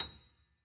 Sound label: key; keySounds